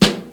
Boom Snare Drum G Key, acoustic snare sound tuned to the G note